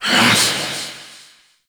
Vocal Melee; Reaper; Warframe; Wraith

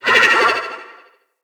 Corrupted Slug Vocal Pain

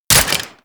Sniper Rifle Mech; Gorg

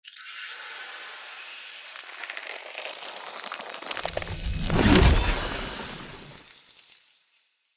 Map Tree Spear Pull

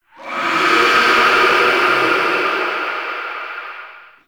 Use Ability Verb; Enemies; Warframe; Tau; Sentient Dactolyst

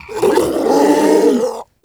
Infested Ancient Strike Scream; Warframe

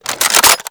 Double Barrel Shotgun Reload Close; Warframe; Weapons; Tenno

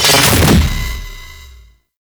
Sentient Symbilyst Shield Lock; Warframe; Abilities

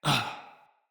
Death, Battle Barks; Envoy

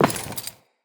Walk Metal, Footsteps; Characters; Enemy; LadyDeora

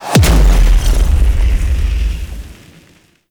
Weapon Explosion; Enemies; Abilities; Ethereal; Warframe; Zariman; Void Angel

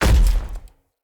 Step Default, Footsteps; Enemy; Nimrod

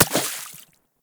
Bullet Impact Water; Warframe; Weapons; Bullet Impacts

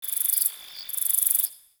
Cricket; Warframe; The New War; Ambience; Zariman

